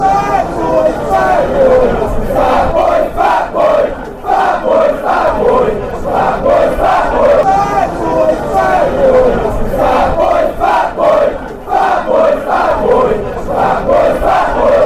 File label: fat boy fat boy, chants